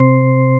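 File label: C3 HAMMOND; roland1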